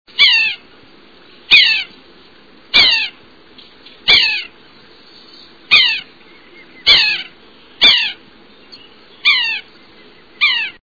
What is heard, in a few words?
Red naped Sapsucker